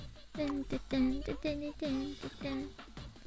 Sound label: Singing; NSO bgm; IRySinging